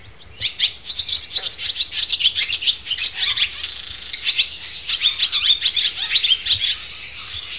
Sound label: barn swallow